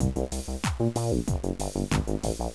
groovy; Querkz 1.5

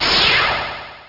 Laser Fire; Mortal Pongbat

